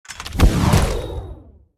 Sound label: Normal Attack; Makobo